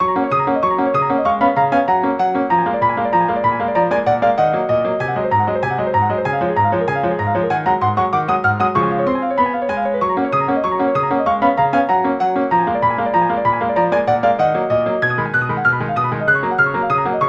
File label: Galop brillante; synth